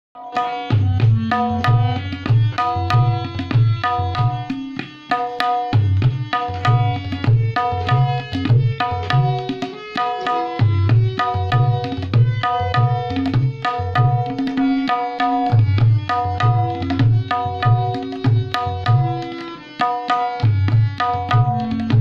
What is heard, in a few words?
Tabla with Raag Bhairavi